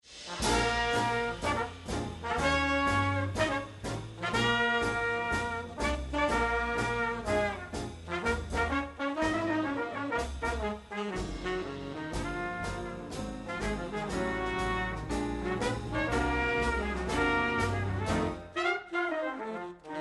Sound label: Jazzgroup